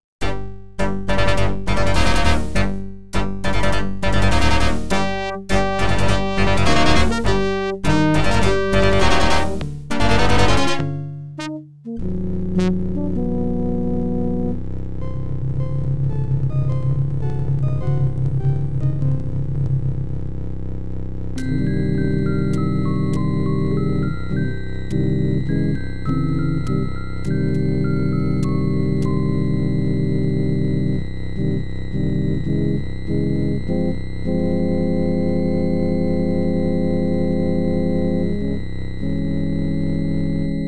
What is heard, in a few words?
OPL2; X WING